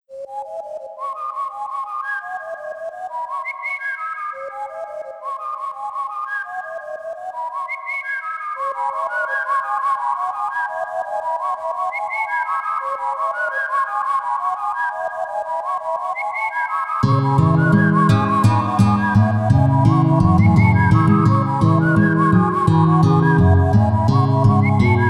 celtic whisper celtic breeze 432 hz

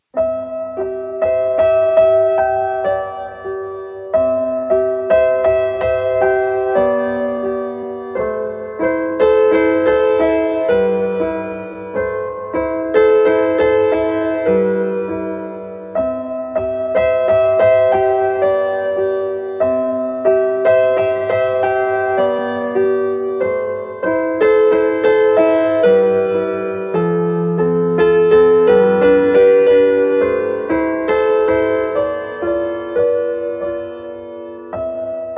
piano music 432 HZ; JESTES MOIM SWIATEM

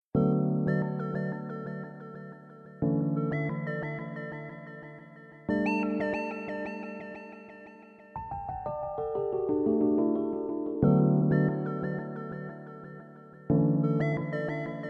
vocal chill 432 hz

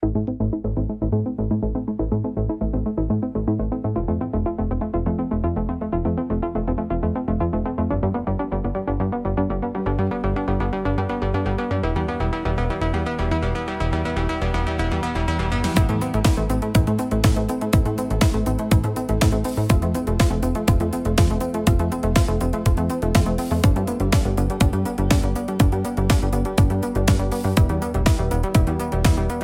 Electronic Impulse

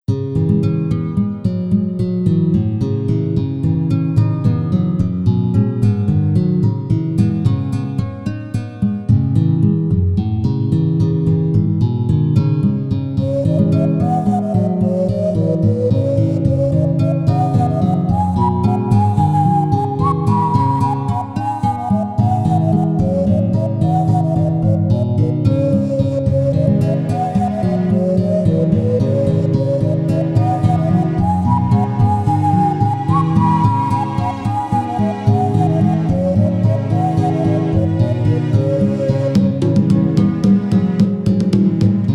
celtic 432 hz

